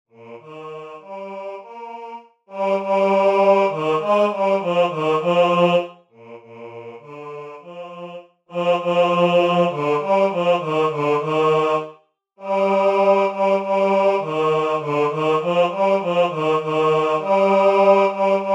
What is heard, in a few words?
tenor; Wer klopfet an